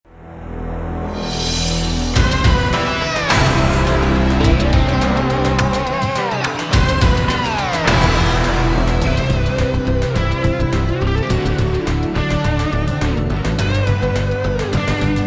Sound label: Rock and Slide